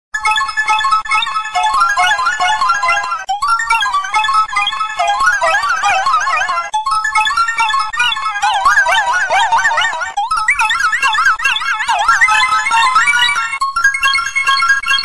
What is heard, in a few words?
iPhone Twist Melody